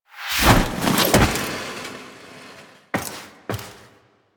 Ship Cinematic Land; Warframe; Rail Jack; Corpus Ship

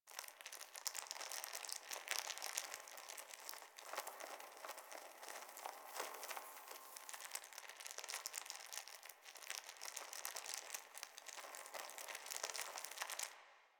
Exterior Critter Loop; Warframe; Infested Eris; Ambience

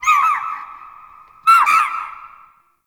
Forest Open Glade Bird Far, Day; Ambience

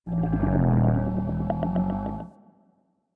Landscape Mushroom Creak, Ambience

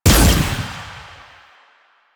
Fire Shot

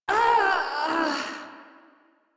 Death; Priest Quest; Palladino

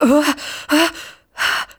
Breath; Quests; The New War; Throne Room; Fight

